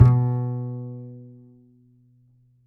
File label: A, upright acoustic bass, A string